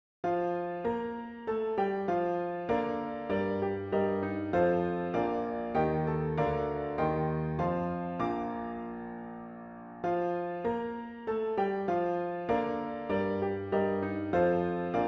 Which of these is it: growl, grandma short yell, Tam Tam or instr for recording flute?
instr for recording flute